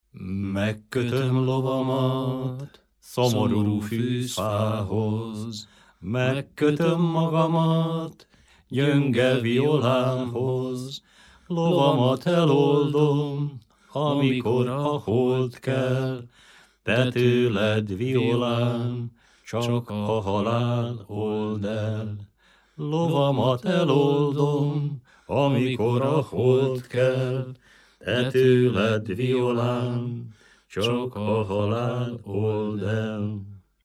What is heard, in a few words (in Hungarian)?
nepdal; megkötöm lovamat